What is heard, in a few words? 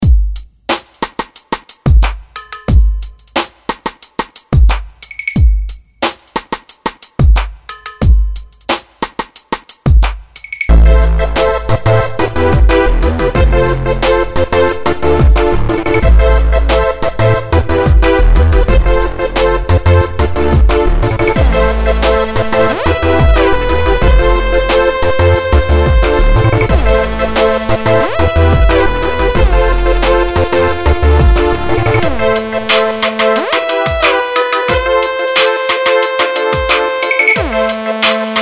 Hip Hop Party